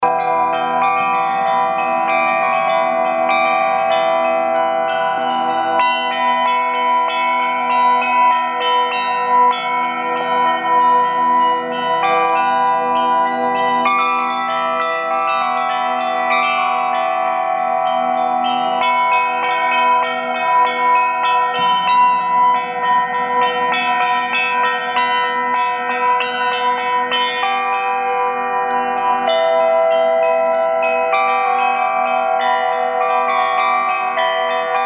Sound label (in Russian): металлическое било; обучение на барабанах